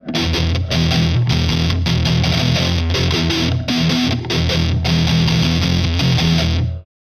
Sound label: Elect Guitar Hvy Rhyt, electric guitar